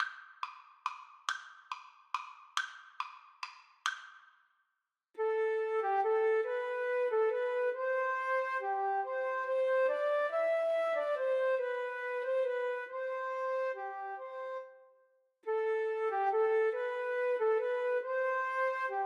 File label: siciliana, Flute duet